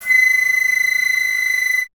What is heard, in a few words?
flute B; Miroslav Vitous - Solo Instruments VOL-2; Solo lnst. II